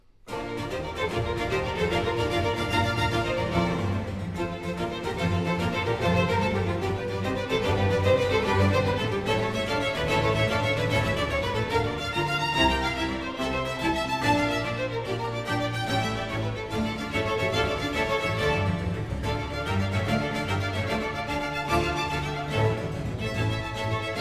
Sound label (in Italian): Concerto per archi in Si maggiore, Allegro Assai; Antonio Vivaldi (1678 - 1741)